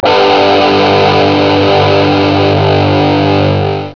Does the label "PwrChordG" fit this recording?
Yes